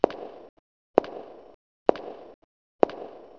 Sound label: foot